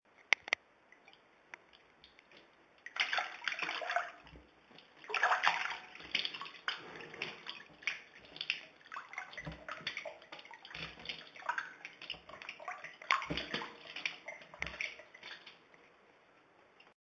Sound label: foot spa